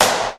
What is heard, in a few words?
smooth clap single hit G# key; single hits one shots